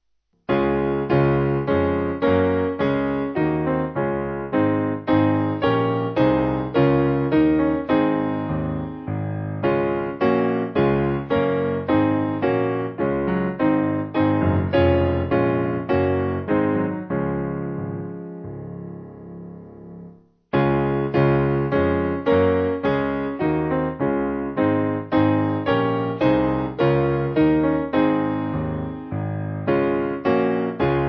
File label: SPiano; Simple Piano; Bedford